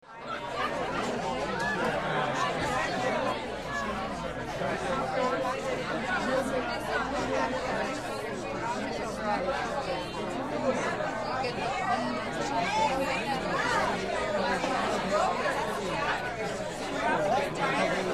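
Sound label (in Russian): бар паб толпа звуки среди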